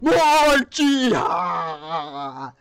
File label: aaaaaaaa